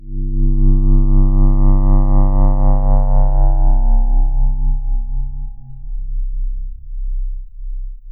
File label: Powerplant, FM; Polyend